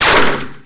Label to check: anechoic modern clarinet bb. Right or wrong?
Wrong